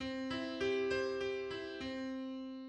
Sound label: Young temperament major chord on C